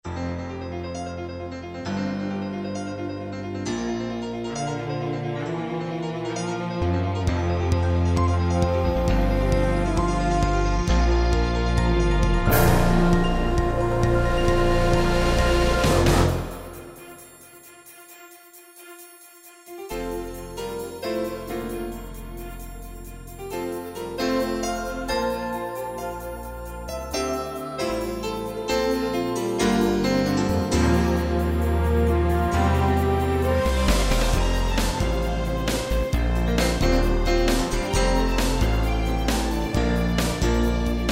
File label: Modern Orch, Kurzweil K2000; Orchestral ROM Supplemental Disk